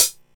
natural pedal hi hat single hit c sharp key, Royality free closed hi hat sample